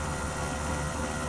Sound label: radar servo; machines mechanical